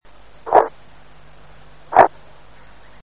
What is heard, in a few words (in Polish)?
odgłos wydawany przez dropia; z rodziny dropiowatych (Otididae), rzędu żurawiowych (Gruiformes)